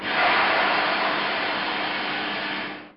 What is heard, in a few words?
Air Zero; OpenBVE